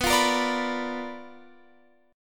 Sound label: B Minor Major 7th Flat 5th Guitar Open F; BmM7b5; strummed; x,18,17,17,17,18